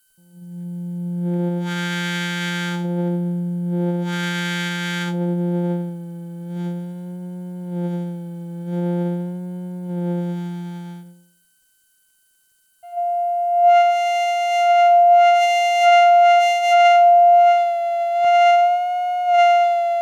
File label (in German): Striso board Ein Ton diverse